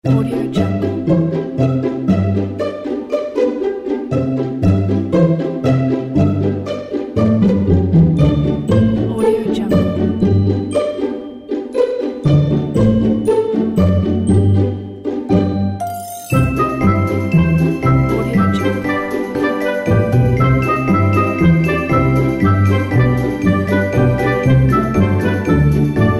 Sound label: positive pizzicato